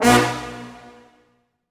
brass accent; Orchestral Loops

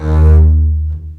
CBS D#2 MARCATO, String Collection; East West - The Ultimate String Collection

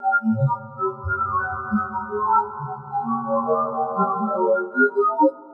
BASE, ELECTRONC

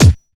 BD; Best Service; Dance Mega Drums

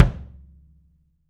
kick axis, Studio Kick Axis; E-MU Producer Series Vol. 5 – 3-D Audio Collection; 3D Percussives

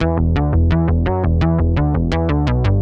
Soft 170 E, bass; musicradar; bass boutique

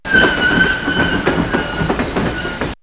The Grinder; X-Static Goldmine

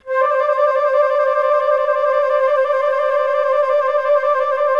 FL TLMI C4; Best Service; Peter Siedlaczek's Advanced Orchestra

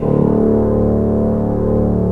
TINE STRINGS

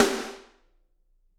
SD, Drums; Bob Clearmountain Drums I

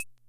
Hammond Auto-Vari 64 Wood Block, drum machine; Hammond Auto-Vari 64 Ableto Project; 300 Drum Machines

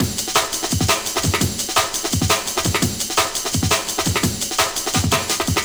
Hammer Break, Drum & Bass, Beats & Breaks; Fluid Dynamics

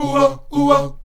O-WA C#3, Afro Chants, Oh-Wa Oh-Wa; Roland LCDP11 Africa VOL-1

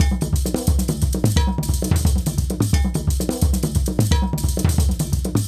African Drum 75 bpm

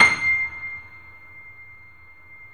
The Piano, sustain

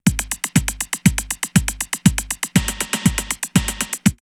Dependent 120, drumloops Rave; X-Static Goldmine; 90 s Sample CDs

